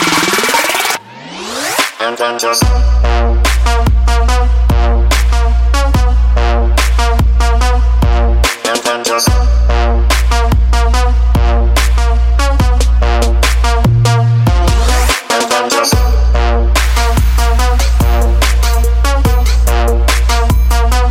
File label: iphone6 dubstep ringtone